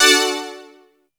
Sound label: KEY5C, 150BPM